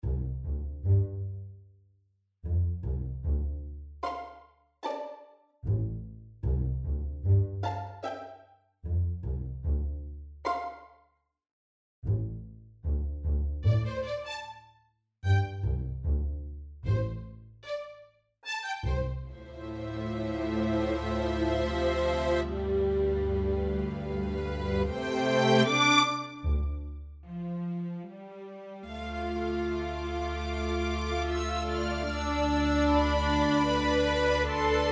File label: impromptu on strings; assembly2006; fast music